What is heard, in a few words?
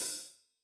hihat open, gretsch kit